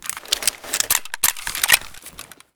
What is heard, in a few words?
taurus misfire